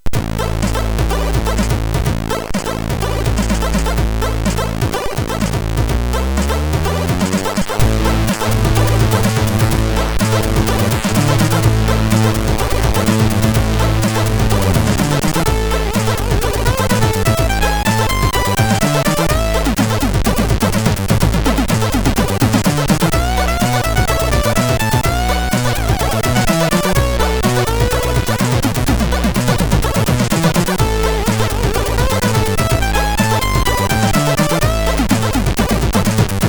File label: Tune, MOS6581R3